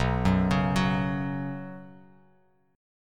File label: B Suspended 2nd B Bass Standard, chord; Bsus2 arpeggiated; 7,9,11,11; JGuitar